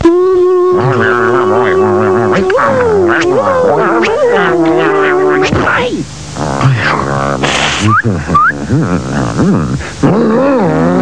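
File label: car sound; Macworld (1998-05); ANIMAC Demo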